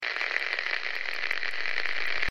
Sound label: radio noise